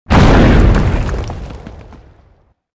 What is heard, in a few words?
stone explosion